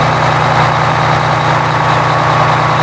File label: submarine diesel exterior; Silent Hunter 3